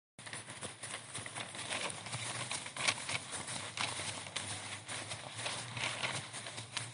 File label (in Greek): περπάτημα σε χόρτο